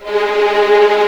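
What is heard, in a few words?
G#5 tremolo, String; East West; The Ultimate String Collection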